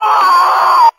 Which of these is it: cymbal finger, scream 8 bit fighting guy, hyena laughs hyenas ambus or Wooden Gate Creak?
scream 8 bit fighting guy